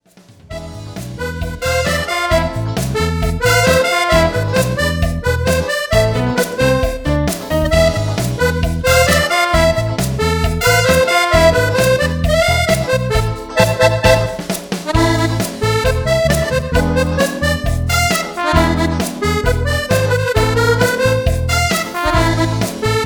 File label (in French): musette